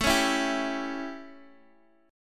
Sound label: A 7th, Guitar DADGAD; chord